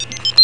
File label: startup